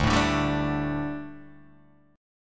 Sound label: D Suspended 4th D, Guitar, DADGAD